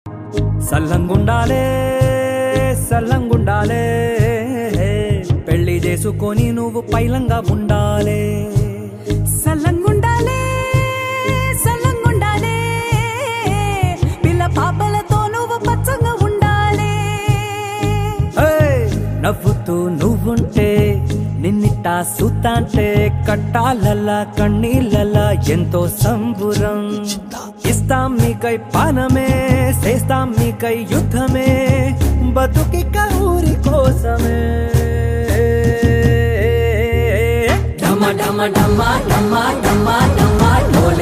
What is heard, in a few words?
song in telugu